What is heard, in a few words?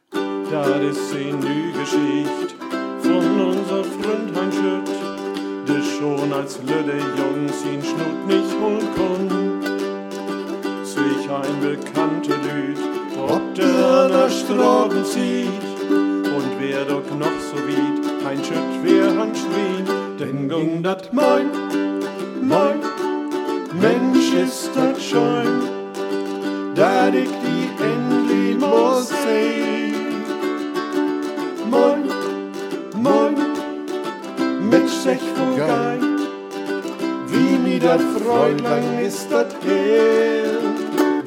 acapella; Moin Moin